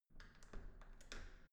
entrance door handle use

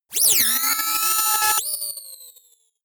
sci fi swell short; comedy-cartoon; soundpool

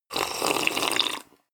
slurp tea; koerpergeraeusche; menschen; soundpool

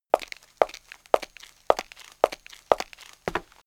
step shoe on street

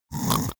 snore; soundpool

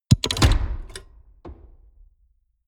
mechanics medium reverberant

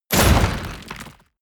explosion dirt metal short; explosionen; comedy-cartoon; soundpool

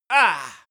male exert; soundpool

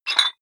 dumbbell pull up rattle soft, fitnessstudio

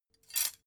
dough hook grab; industrie-wirtschaft; gastronomie

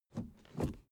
suv idle 1st gear change soft slow; verkehr transport; autos

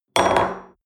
espresso upset table medium short

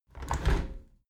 window handle squeak turn close halfway regular; objekte; fenster

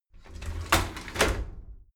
shower slide door inside open regular

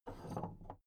big plastic case handle take soft; objekte; kiste

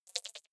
click erratic wide buzz, signale

